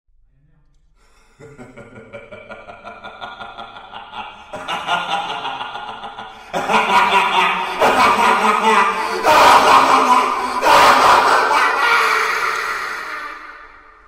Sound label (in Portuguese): risada, padre